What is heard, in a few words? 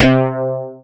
BAS TX-802; Best Service Gigapack 2